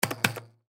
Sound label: Arcade Game Double Tap Button Spring Buzz; Arcades; Blastwave